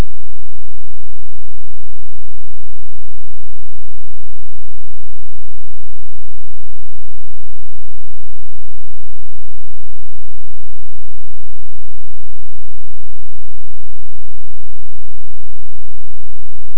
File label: Koala